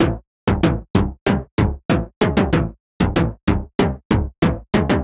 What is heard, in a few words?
Sheet Kick 95, horrorscape samples 95bpm